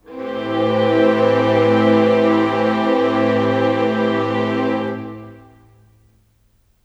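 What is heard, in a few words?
Pad A minor 7, Orchestral; Sonic Foundry (Sony Creative Software); Orchestral Series VOL-4 - Rock and Pop; Pads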